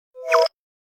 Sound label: battery recharge, Nokia 808 PureView